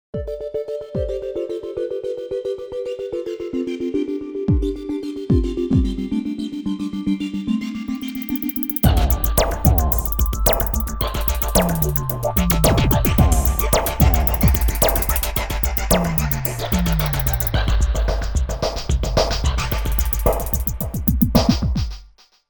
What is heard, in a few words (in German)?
Genre Elektro